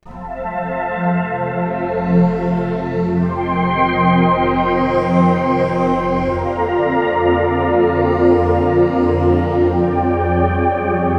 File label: Game Over; Shin Megami Tensei Devil Summoner Soul Hackers; 3DS